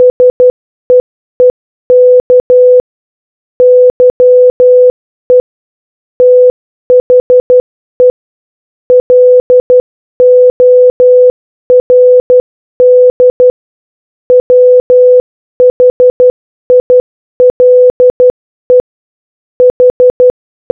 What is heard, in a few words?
Morse Code Sound; Seek ye the Lord while he may be found call ye upon him while he is near; Isaiah 55